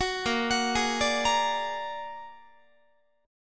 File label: Bb Augmented 7th Bb down Guitar 4A,4E,5A,5D,5G sharp,6C sharp; Bb+7; arpeggiated; JGuitar